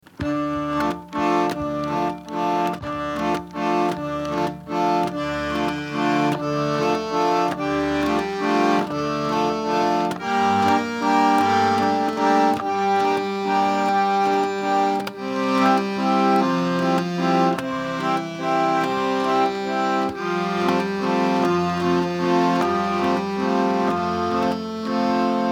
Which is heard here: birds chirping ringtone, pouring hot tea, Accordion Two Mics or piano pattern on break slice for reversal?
Accordion Two Mics